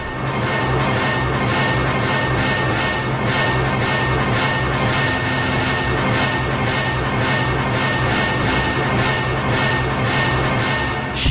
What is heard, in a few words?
hardcore orchestral hitting; ORCHESTRAL and CLASSICAL